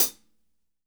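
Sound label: hat closed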